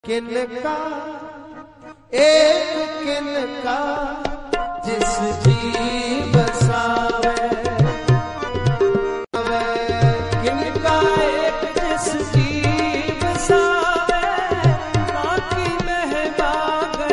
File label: gurbani shabad kirtan, punjabi